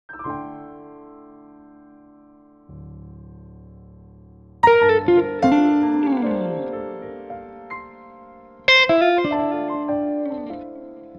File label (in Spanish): piano